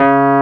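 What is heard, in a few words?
WURLY NO, Wurlitzer electric piano; Vintage; Sonic Reality; Vintage Timetraveler; WURLITZ EP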